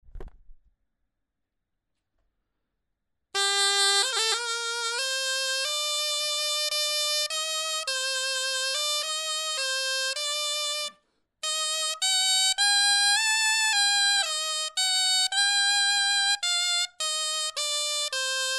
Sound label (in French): clarin etude